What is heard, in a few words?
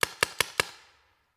Paintball Gun; Sound Ideas; Guns